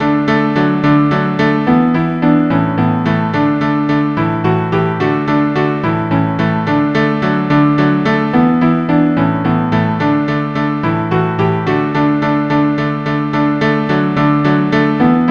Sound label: melody and drone chords; Top of Cork Road